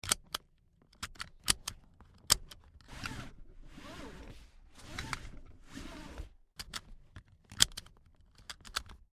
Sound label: Car Seat Belts - Clunk clicks and rolled out and in; Vehicles; Tom Hutchings